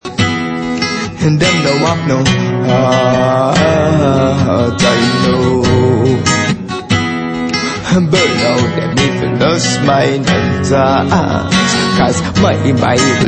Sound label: Acoustic Version